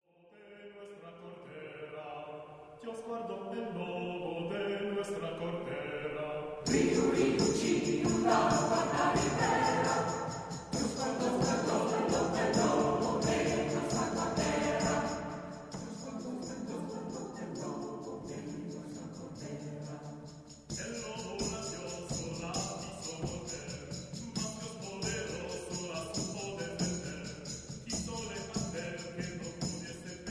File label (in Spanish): Riu riu chiu